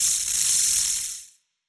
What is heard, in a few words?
lightning hit electrical sparks, weapons; kaiju; Stellaris; biogenesis